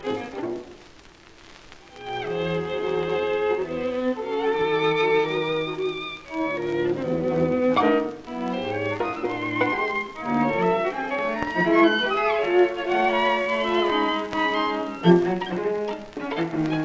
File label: String Quartet Allegro; Op 37; Schoenberg